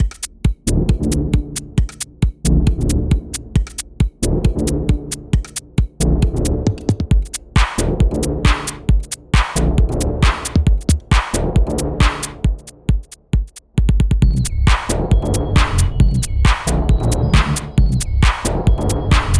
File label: Pumpy house; Kallon